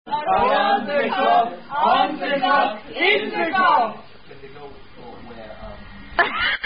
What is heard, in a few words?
Sweedish Chant, Sweedish Drinking Toast